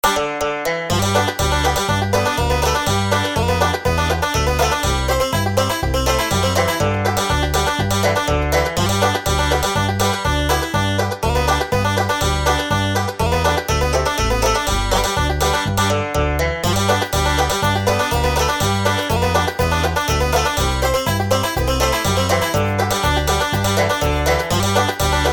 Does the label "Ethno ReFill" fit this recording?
Yes